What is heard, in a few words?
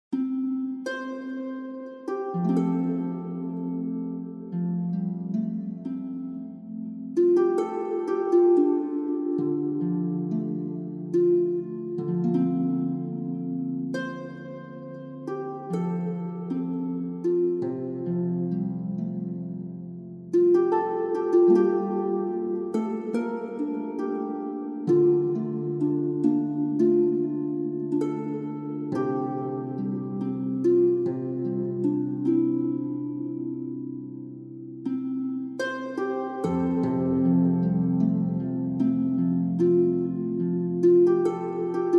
Harp B